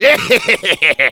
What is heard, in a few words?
Demoman laugh evil, fr